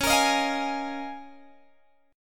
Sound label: C sharp 6th Add 9th, Guitar, Open G; down; chord shape